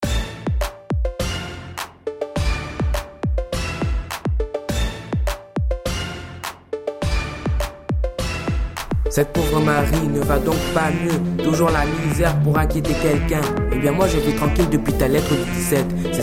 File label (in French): rap